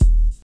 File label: old school dry steel kick drum sample d sharp key, kick single hit